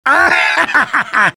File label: laugh short; demoman